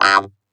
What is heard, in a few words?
Synth Shots; Vengeance Samples